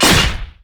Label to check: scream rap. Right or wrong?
Wrong